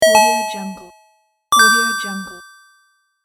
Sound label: retro collect coin; magicsoundeffects